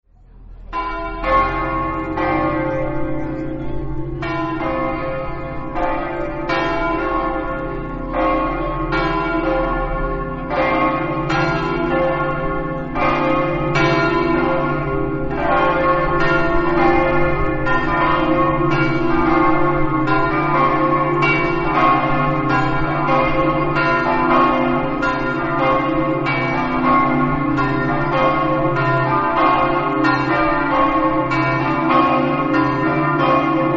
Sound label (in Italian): glemone, campane